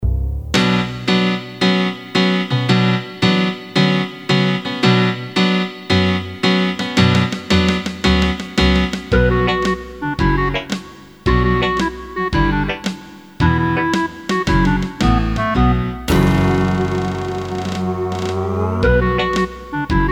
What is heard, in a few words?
Casio GZ-50M